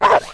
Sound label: baby leopard attack